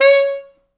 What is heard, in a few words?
C#4, Pop Brass; Best Service ProSamples vol.19; AKAI